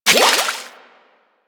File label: Water Fight Sprinkler Ammo Pick Up; Warframe